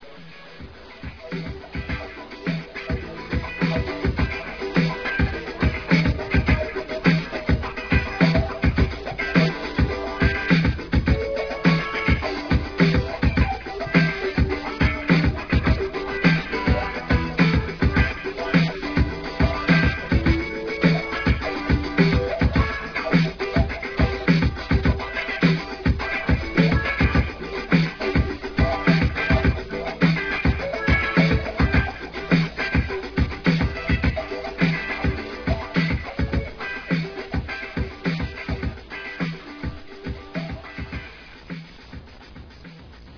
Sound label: funk